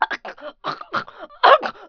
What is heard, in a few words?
drown; PC Gamer IT CD 41; Daikatana; mikiko